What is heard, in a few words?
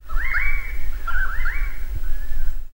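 Kurrawong Cry Ambience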